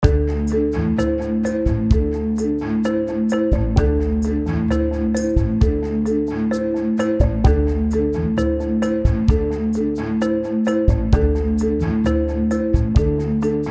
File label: Corporate Identity, mallet music